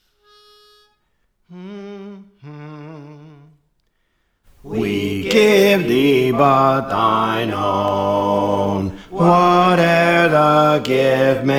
Bass; Praise For The Lord Training

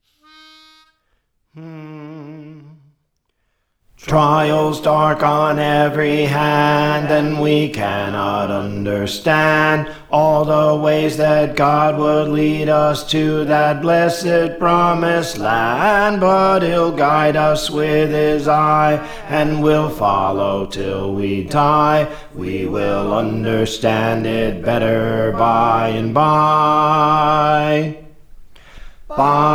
Bass; Trials Dark on Every Hand; Praise For The Lord Training

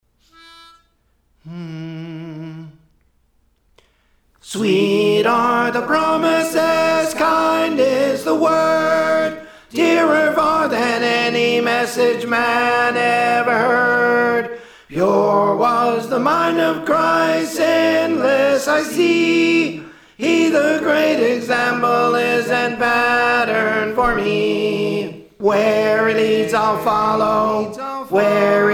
Tenor; Praise For The Lord Training

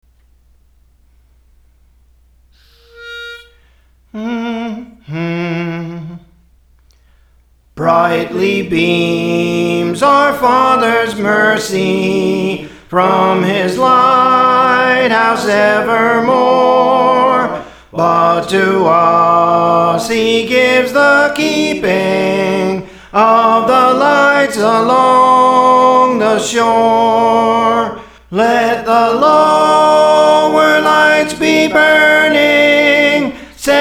Soprano, Songs; Praise For The Lord Training; Let the Lower Lights Be Burning

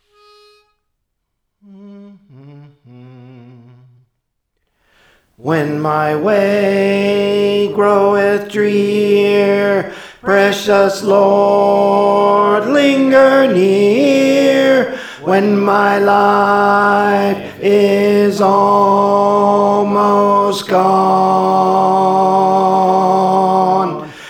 Tenor; Praise For The Lord Training

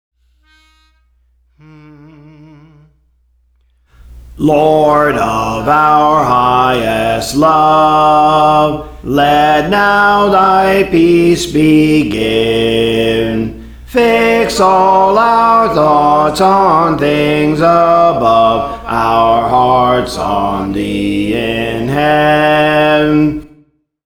Bass; Praise For The Lord Training

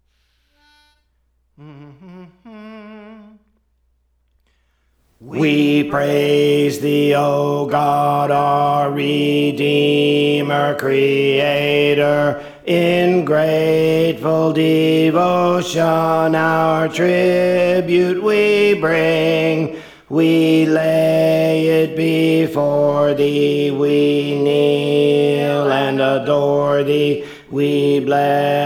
Alto; Praise For The Lord Training

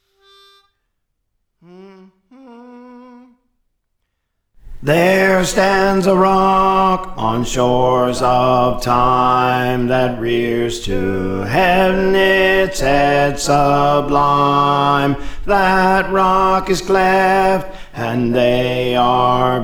Bass; There Stands a Rock; Praise For The Lord Training

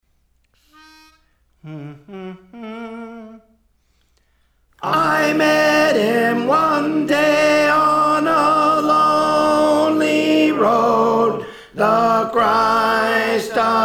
Tenor; Praise For The Lord Training

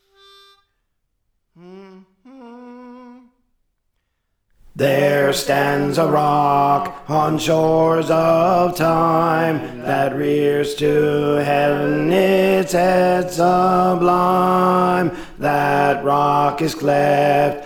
Alto; There Stands a Rock; Praise For The Lord Training

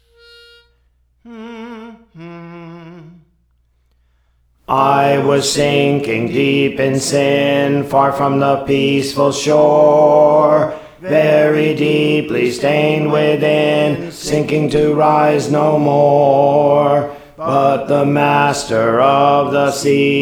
Alto, Songs; Praise For The Lord Training; Love Lifted Me

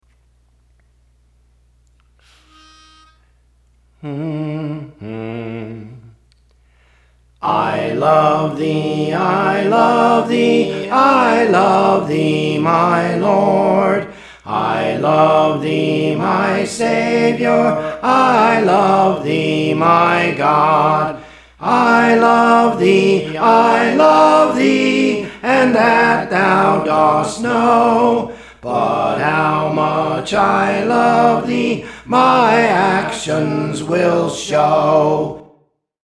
Soprano; Praise For The Lord Training

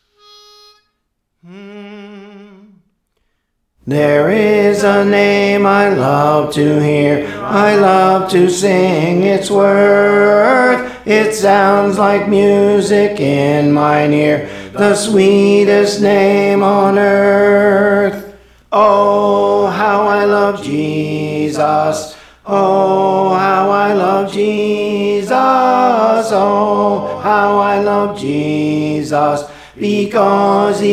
Tenor, Songs; Praise For The Lord Training

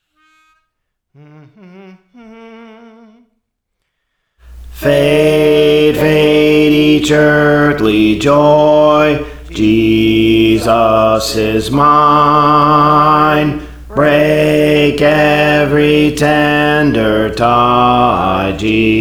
Bass; Praise For The Lord Training; Jesus Is Mine

